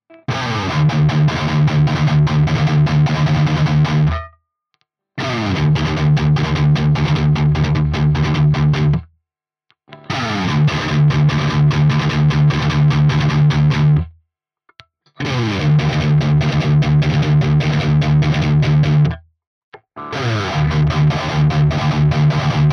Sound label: rhythm Muted; RGT1270